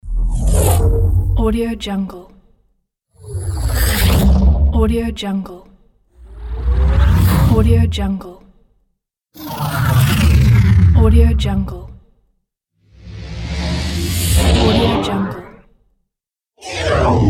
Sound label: monster